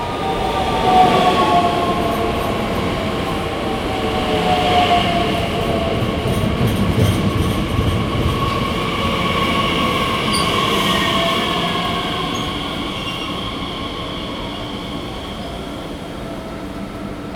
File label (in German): Geräusch